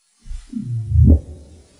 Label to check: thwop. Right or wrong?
Right